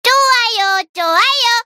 positive vocalization; voice packs

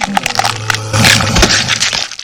death all; cartooncat; slender

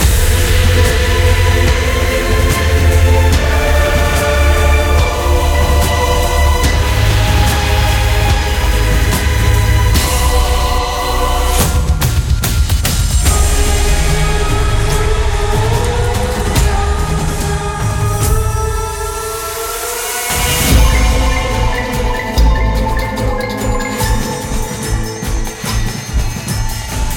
loop, wave music; zombiesurvival

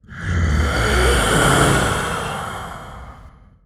idle, male zombie; nmrih; zerosiki; slender

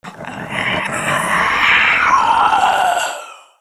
female zombie sighted; nmrih; zerosiki; slender

